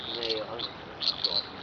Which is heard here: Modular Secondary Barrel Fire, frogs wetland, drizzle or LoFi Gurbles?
frogs wetland